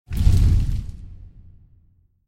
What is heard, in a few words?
Wizard Light Up Hand Flame; fire Wizard Desktop; Axial